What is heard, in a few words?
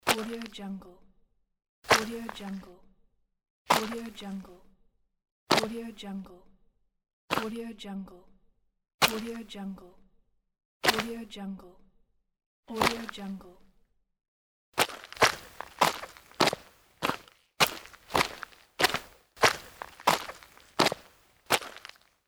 dirt footsteps pack